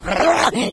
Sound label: rage, infected female; npc; Left 4 Dead 2; Source Engine